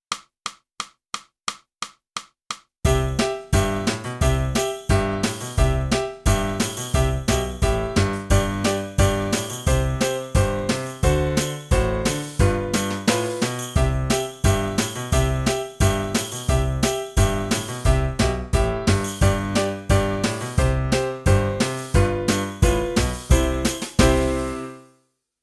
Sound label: flute; boosey